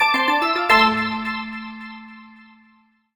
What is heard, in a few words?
points ticker bonus score reward jingle